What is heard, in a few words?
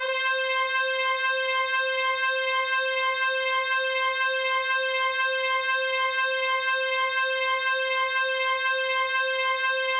Brass, Yamaha DTXpress